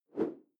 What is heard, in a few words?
whoosh simple slash big